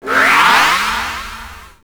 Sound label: Level Start